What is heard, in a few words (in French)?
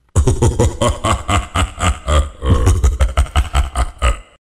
fete foraine ahahah